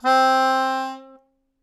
Melodica Long, Long Notes Chromatic

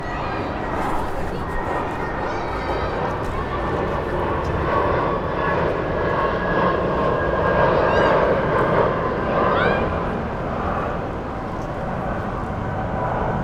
Schoenefeld Berlin Airport Runway Ambience; Sound Scapes

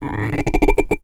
Finger Rub, Large Wine Glass

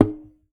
Classical Guitar Extended Technique; Classical Guitar Extended Technique Mono

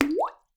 Plip

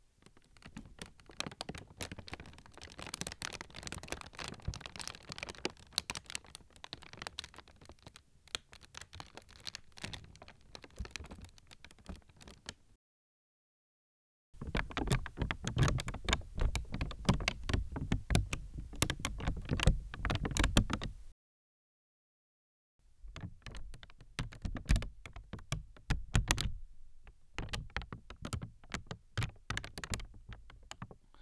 Paper

